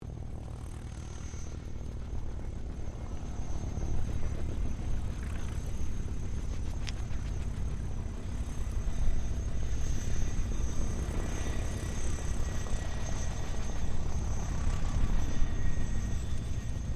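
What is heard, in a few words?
helicopter Bell UH1 Iroquois idle; RAN UH-1 Iroguios; Helicopters (Sound Effects:Vehicles)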